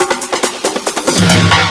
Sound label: Loops Fills; Vengeance Samples